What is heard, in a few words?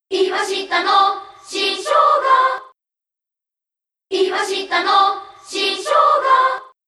sound logo